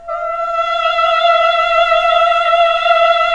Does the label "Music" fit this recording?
No